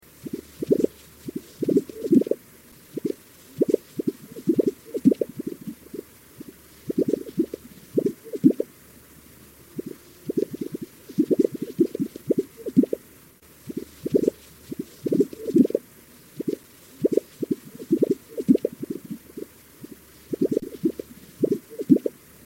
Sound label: Gunnison Sage Grouse; Kevin J Colver